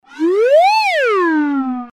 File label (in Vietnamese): Am thanh nhay hoat hinh